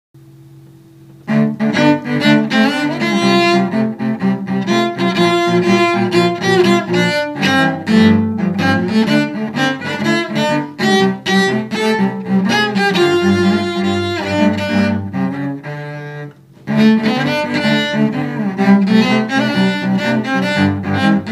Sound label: Cello